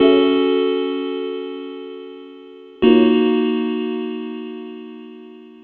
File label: Synth, Trip-Hop Loops; Sonic Foundry (Sony Creative Software); Rondo Brothers